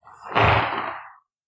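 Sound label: river sound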